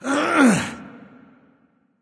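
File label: nonverbal grunt lift; smite; voicepack; ullr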